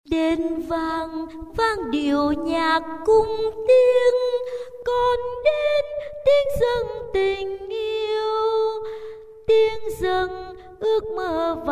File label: tenor